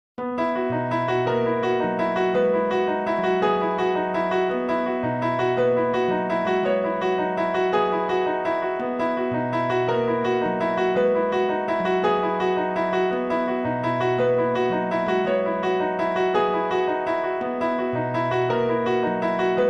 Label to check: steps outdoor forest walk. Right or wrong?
Wrong